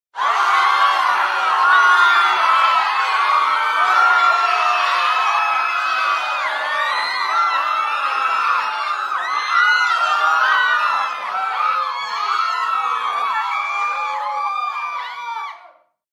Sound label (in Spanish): Sonido de Gente Gritando; 2° REUNIÓN DE DECISIÓN